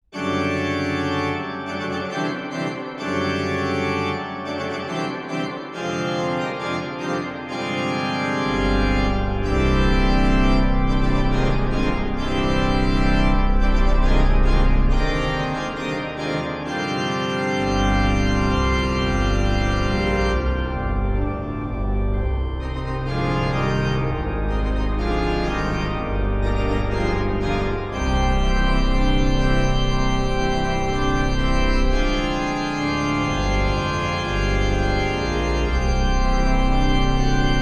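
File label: fanfare laudation, organist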